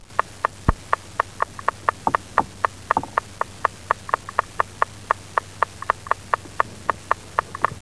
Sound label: not interested frog, clawed frogs